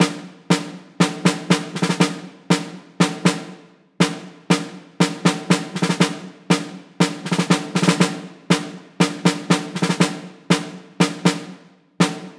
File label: Snares - 120BPM; Percussion Kits; STEMS; Ghosthack; SkyRealm; Kit 01 - Death March